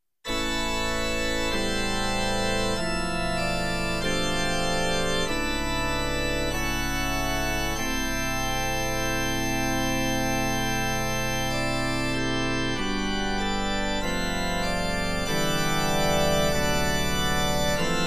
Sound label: organ pieces